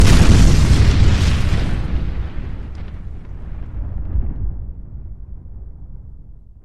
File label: explosion